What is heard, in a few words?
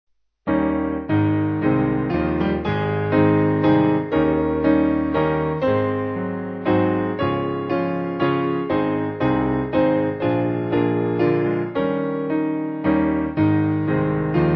Piano